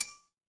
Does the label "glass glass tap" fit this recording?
Yes